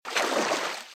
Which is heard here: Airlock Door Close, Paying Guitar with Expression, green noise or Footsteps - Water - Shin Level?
Footsteps - Water - Shin Level